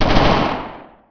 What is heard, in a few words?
light infantry; Emperor - Battle for Dune